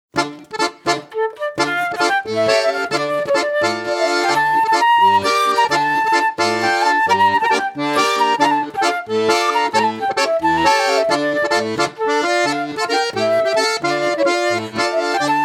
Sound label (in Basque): FLAUTA AKORDEOIA Fandangoa; JOKOA; Ekaina